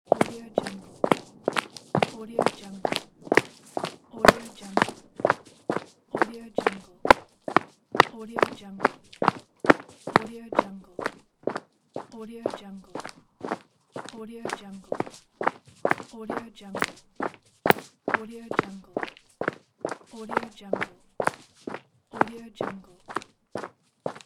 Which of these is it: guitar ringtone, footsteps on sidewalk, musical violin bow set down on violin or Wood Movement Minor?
footsteps on sidewalk